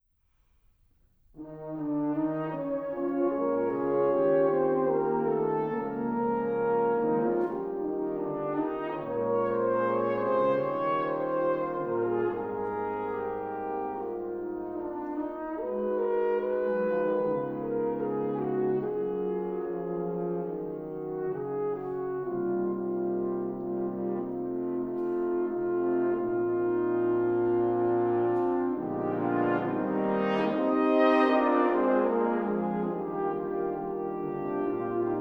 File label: Horns Pop Christmas